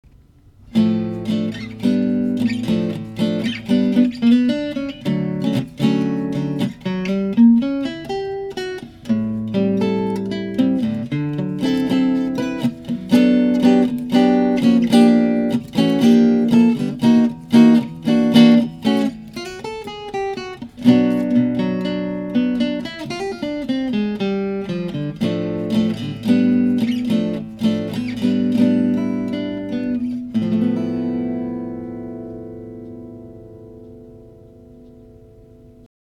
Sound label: Bear Claw Acoustic, guitars